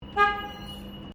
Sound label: Trolley Motorized Luggage Train Station Moving Horn, Electric luggage trolley; Carriages; Vehicles